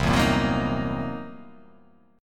C 7th Sharp 9th Flat 5th C; strummed; JGuitar

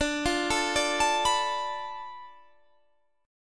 B Minor 7th Flat 5th D, guitar chord

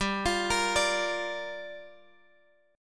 G Minor 7th, Guitar, Drop D

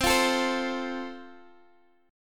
C 6th Suspended 4th, C

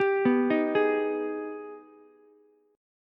Ab Minor Major 7th, down, Ukulele; AbmM7; arpeggiated

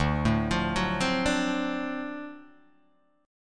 D 7th Sharp 9th D down Guitar Open D

